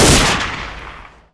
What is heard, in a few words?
webley shoot, weapons; dod 1.3; dod enhancement pack